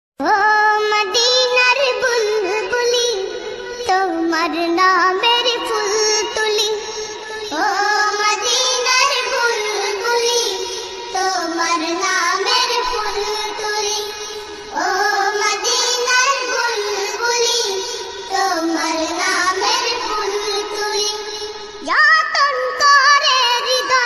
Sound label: islamic ringtone